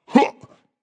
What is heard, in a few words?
Vocal Deep Huh